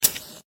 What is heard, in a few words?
Matches; Granny 3; Android